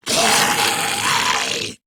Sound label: Laugh Grineer Ghoul Sargeant; Grineer Ghoul Drill Sargeant; Battle Barks